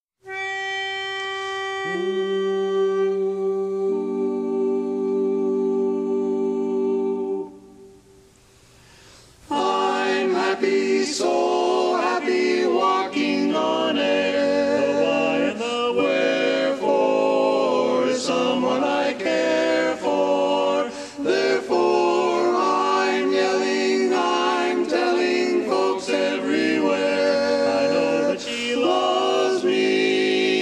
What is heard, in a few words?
Tenor